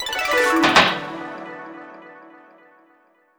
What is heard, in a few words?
Transition; Anarchy Splatcast